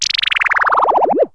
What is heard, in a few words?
d war glis, cartoon accents; KABOOM! - Wild and Wacky Sound Software